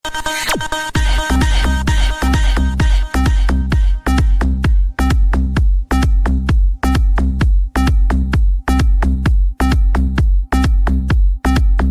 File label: galaxy dance ringtone